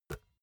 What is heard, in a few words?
basketball catch finger tips soft